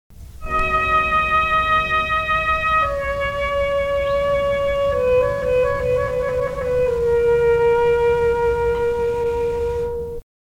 instrumental; mundari words of life

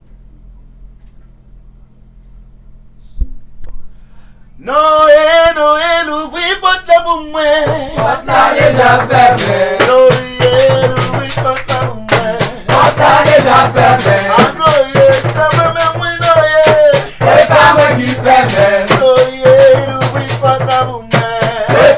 rain song, haitian creole

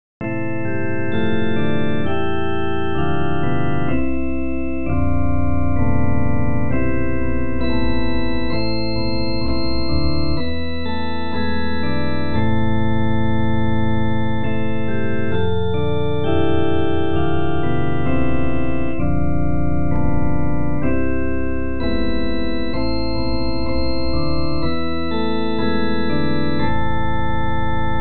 instrumental hymns; o sacred head now wounded

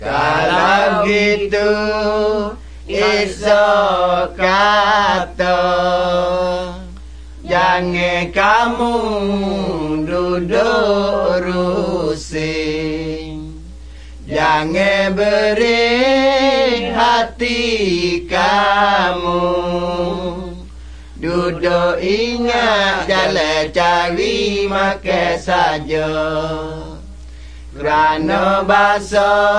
songs malay pattani

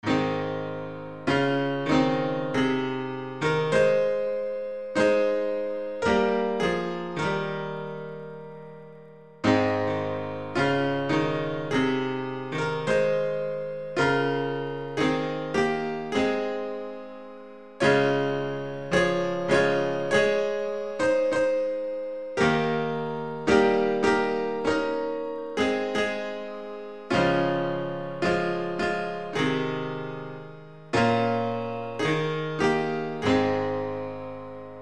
instrumental hymns; more love to thee o christ

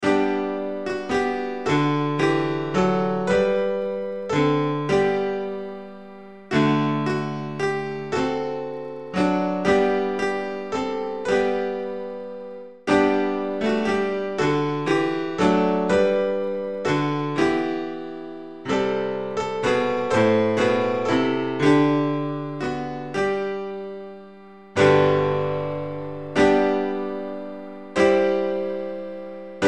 instrumental hymns; grace greater than our sin

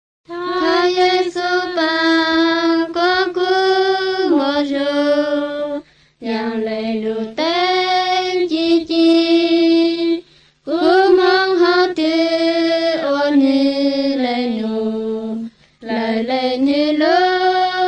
songs hmong blue; bible

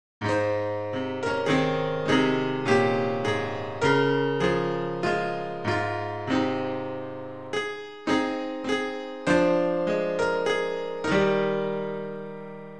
instrumental hymns; softly and tenderly jesus is calling

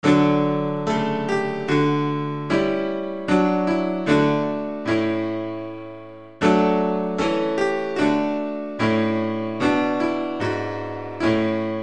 let us plead for faith alone, instrumental hymns

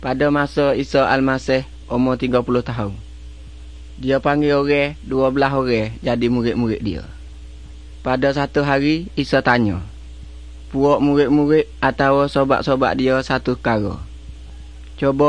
songs malay pattani

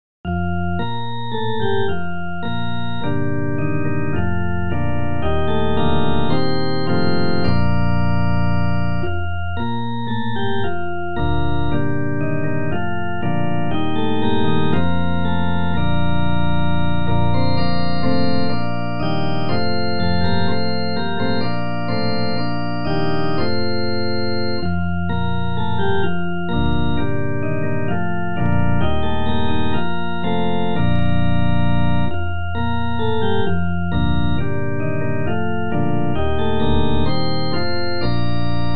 instrumental hymns; hosanna loud hosanna

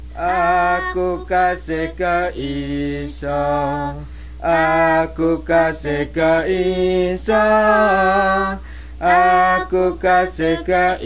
songs malay pattani

